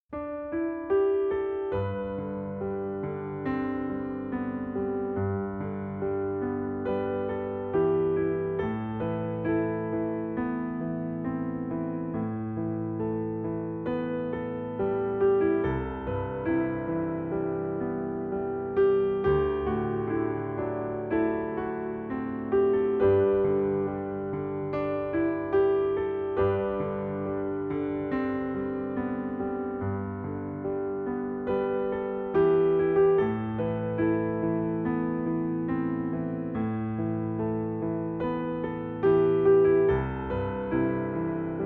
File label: solo piano